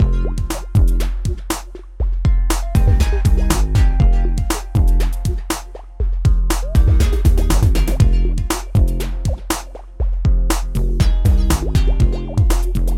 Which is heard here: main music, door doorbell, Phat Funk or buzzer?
main music